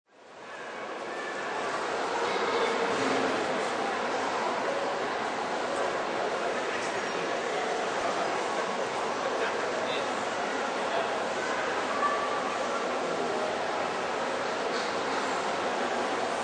Trafford Centre Upstairs, Shopping mall crowd ambience. Trafford centre, large mall.; Recording from upstairs, away from main crowd.